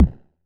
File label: 808 BD short, Roland-808 Drum Hits Bass Drum